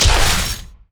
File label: Shield; Redact; orianna